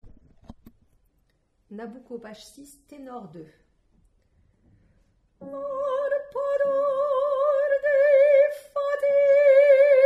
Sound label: Tenor; nabucco